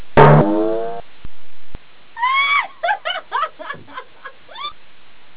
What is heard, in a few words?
bong laugh